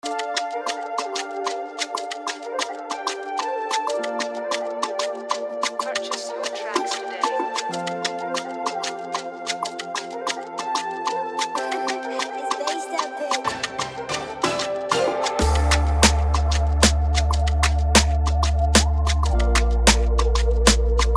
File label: Romance Afrobeat 110 B Minor